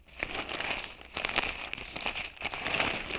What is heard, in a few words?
folding paper